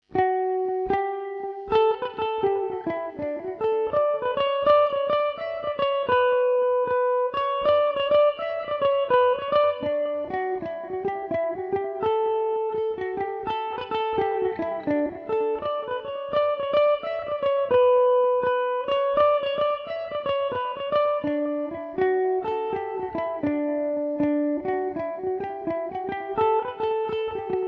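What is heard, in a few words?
D major revelation slow, irish mandolin; Home To Castletownroche (version 2); Revelation Jaguar electric mandolin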